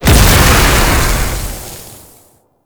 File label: Wrist Gun Alt Explosion; Warframe; Tenno; Incarnon